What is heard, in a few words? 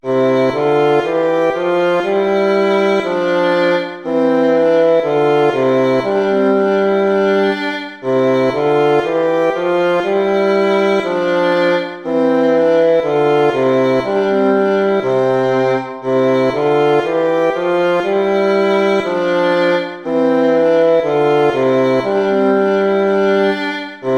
gavotta; hook james